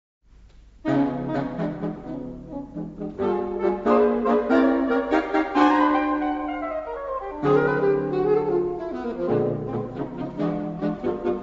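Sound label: Sax Quartet Scherzo; Saxophone Quartet; London Saxophone Quartet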